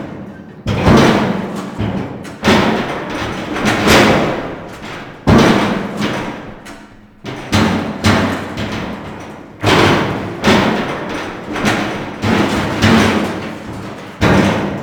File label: Machine Malfunction Metal Loop; Ambience; Props; Warframe; Corpus Railjack